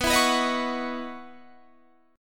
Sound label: C sharp Minor 11th B